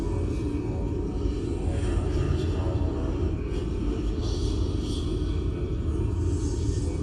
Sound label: death mumble